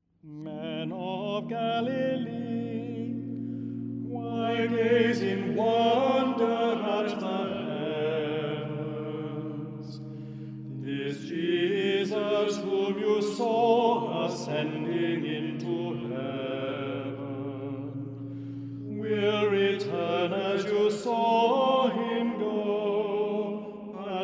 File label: Mode 7 Ascension English